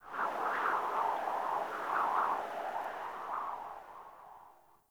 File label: Prairie Day Wind Gust Soft; Ambience